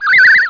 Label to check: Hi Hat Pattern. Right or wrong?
Wrong